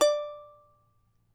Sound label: charango D4, guitars; Spectrasonics; Hans Zimmer Guitars VOL-2